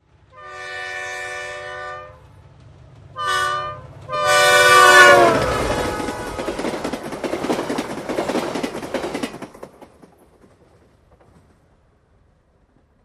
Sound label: Commuter Train Bys